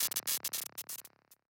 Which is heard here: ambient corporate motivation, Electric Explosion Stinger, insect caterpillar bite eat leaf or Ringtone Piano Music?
Electric Explosion Stinger